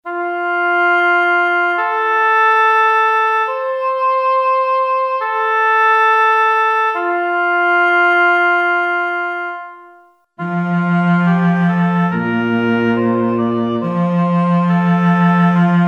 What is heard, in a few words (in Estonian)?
diktaat bass ja soolo